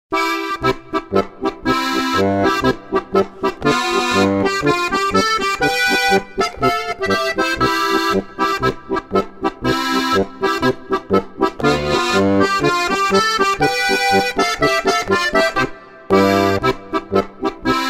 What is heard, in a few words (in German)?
Rucksack Polka